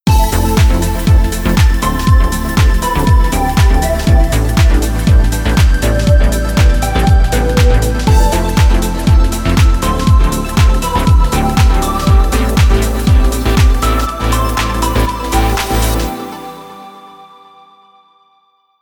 Synth 8bar

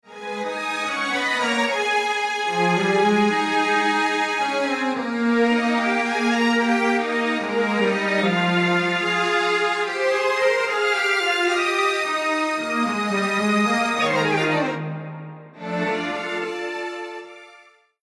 Strings Dual

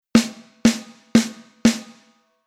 Rhythm Funk SD

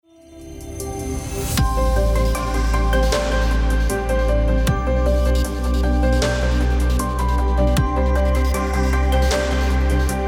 Future Bass Bass Filter

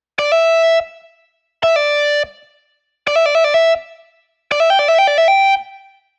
guitar bend

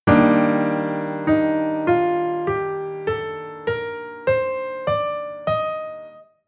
Chord Chord Scale Modal

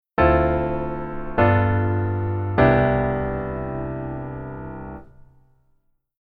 keyboard voicing low

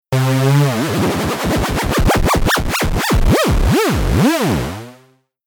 synth mod mod env

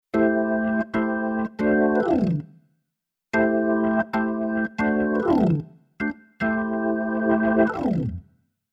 Keyboard Organ Jazz Organ

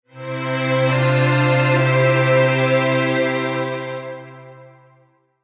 Strings Poly; Hamo AT

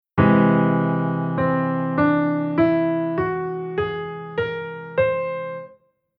Chord Scale Aug

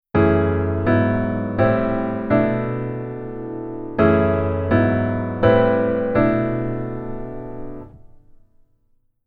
keyboard top note

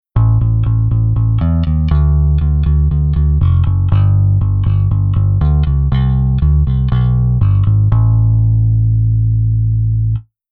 Bass Interval On

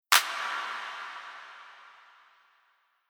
Clap EQ Off; EQ Comp Basic

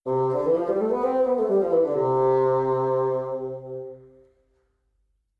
scale

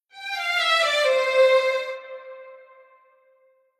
articulation

